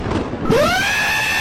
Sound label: bird waaa